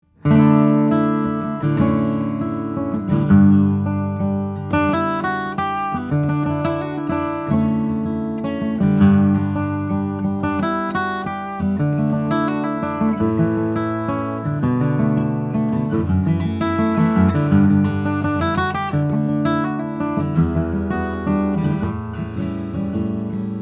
Slope Shoulder Dreadnought, steel string flattop guitar; 1992; koa; spruce